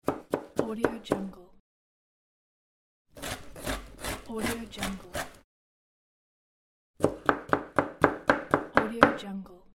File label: knife chop vegetables